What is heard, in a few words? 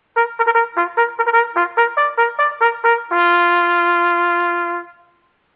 Bugle Call Mail Call